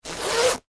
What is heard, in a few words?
Leather Jacket, Zipper, Up; Foley; Clothes; Dressing Sound Effects